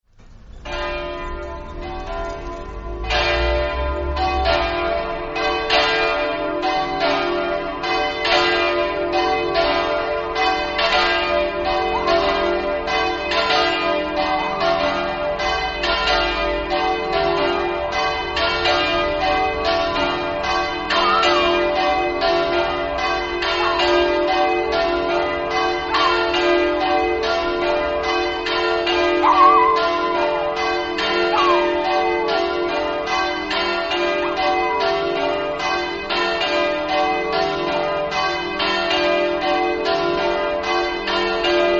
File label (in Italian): villegnove, campane